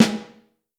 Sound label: Snare Drum, 5X14; Q Up Arts; Johnny.C's Vintage Snare Drum